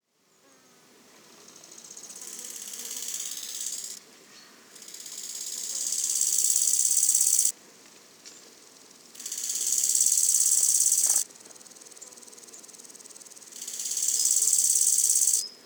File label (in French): criquet melodieux se deplace avant de striduler a nouveau; le Puech; F6 telinga mod Earsight